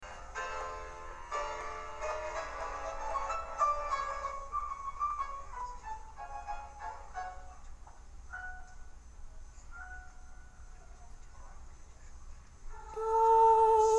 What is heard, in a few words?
bd alto